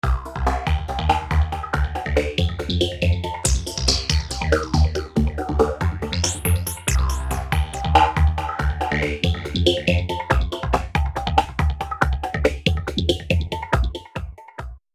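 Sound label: With COSM’s Side Band Filter, even an un-tuned sound like a rhythm phrase, can be played with pitch.; V-Synth; Cavin'Dance (Phrase)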